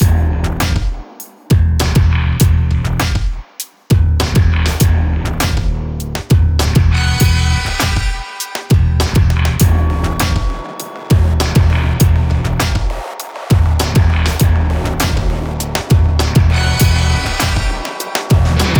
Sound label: theme, music combat